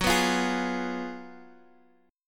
Gb Major 7th Suspended 2nd Suspended 4th, Guitar, Half Step Down